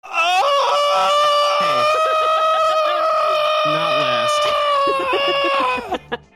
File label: yell